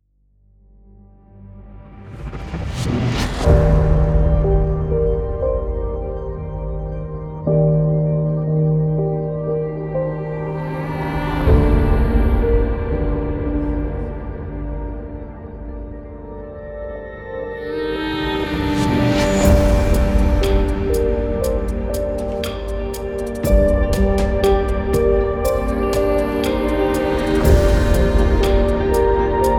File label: Fairy Tale Background Trailer